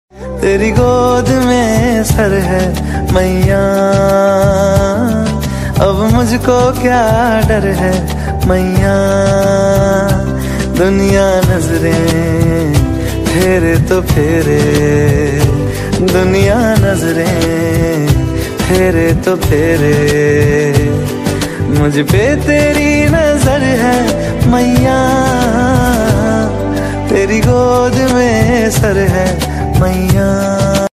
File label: Navaratri Durga Puja Ringtone